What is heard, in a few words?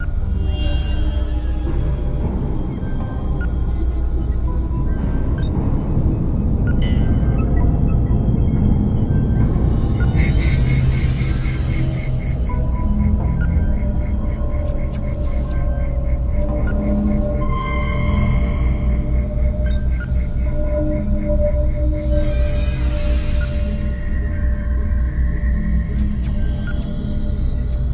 Ambient ~ Pirate Electronics; rayman 2